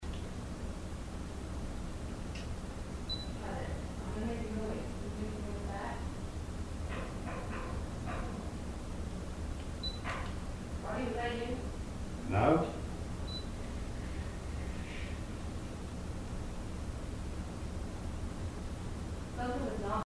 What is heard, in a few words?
KNOCK; Case 017 Barter Theatre Theatre Balcony; RESPONSE